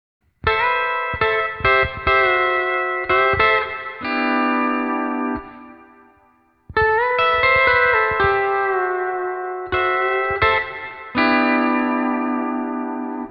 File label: C6 A to B Pedal